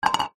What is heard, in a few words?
household cup metal camping set down wood counter